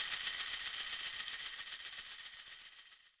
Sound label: Tambourine Roll Fade Out, Latin Percussion; Sonic Foundry (Sony Creative Software); Processed